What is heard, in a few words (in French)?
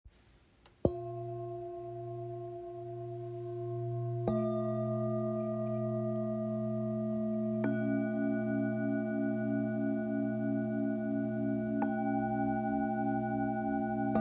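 Set ceremonie, bol chantant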